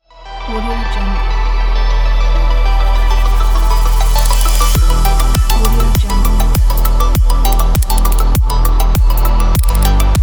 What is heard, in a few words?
innovation tech logo; emodjimusic